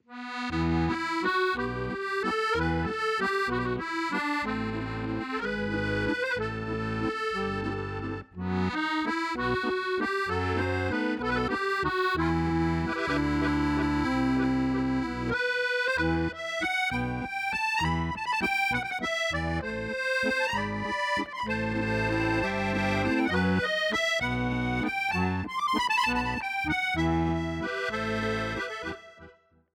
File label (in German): Akkordeon Musette; TA20X; mikrofone akkordeon steirische harmonika; Rumberger